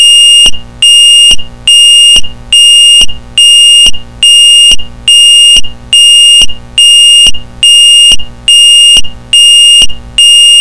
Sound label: pulse